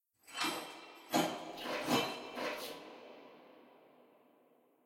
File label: sfx br anim walk workout in phase